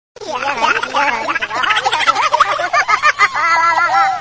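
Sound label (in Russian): хихиканье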